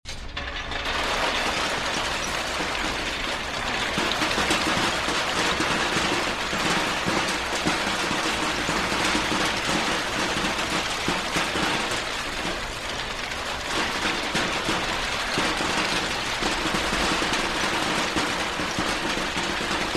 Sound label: Coal Truck Unloading; SOUND EFFECTS1